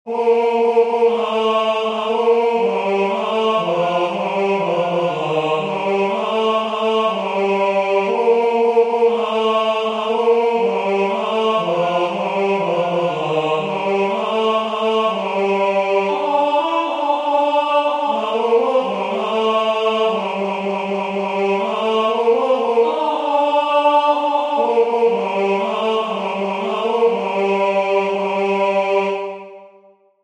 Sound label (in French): Chanson a boire Tenor; Bataille Gabriel